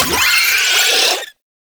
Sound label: Suicide Scuttler Pre Death Vocal; Enemies; Gameplay; Boss; Warframe; Oraxia